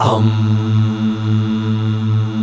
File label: UMM A2, POP CH